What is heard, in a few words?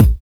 bounce kick; Drums In Your FACE!